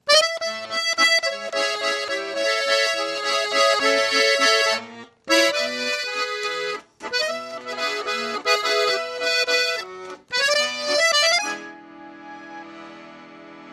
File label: Dallape 140, accordion